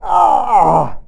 ouch

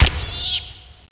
rat die

